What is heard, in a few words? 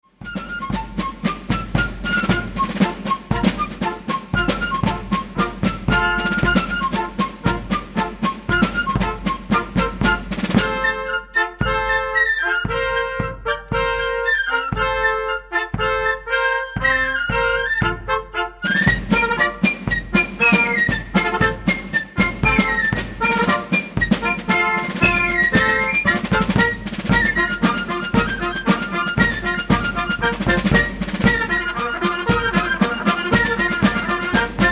Fife Bugle Drum Corps